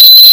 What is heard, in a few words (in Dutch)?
korte piep